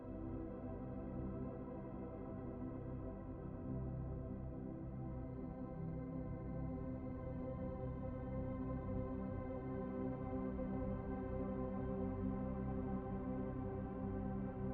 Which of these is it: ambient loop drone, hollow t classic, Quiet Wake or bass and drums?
ambient loop drone